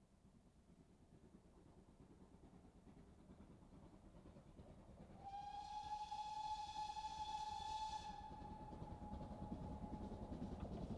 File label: Steam Train, Derailed And Crash; Emergency; BBC Sound Effects Library